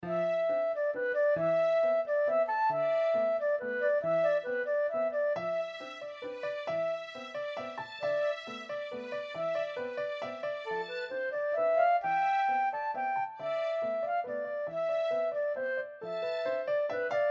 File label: Piano Trios Hurdy Gurdy; Piano Trios boek 3 no 2